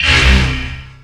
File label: bad, ui